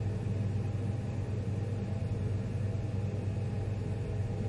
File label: theatre lobby